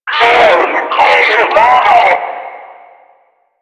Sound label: Death; Warframe; Lasrian; Lasrian Enemy B